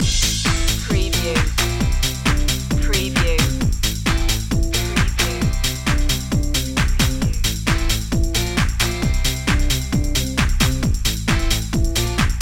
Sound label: rock the house loop